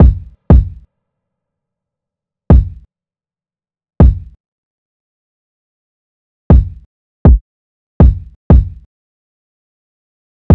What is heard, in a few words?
Drum Loop 120 BPM Kick; Loop Stems; Cymatics; Vibes II Drum Loop Collection; Operation